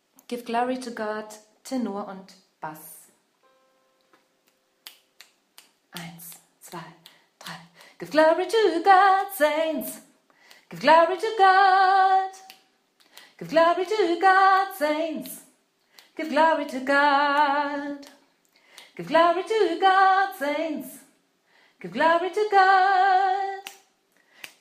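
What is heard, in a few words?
Give Glory To God Tenor Bass, gospel choir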